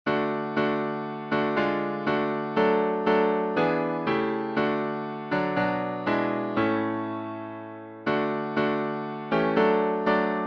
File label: Hymns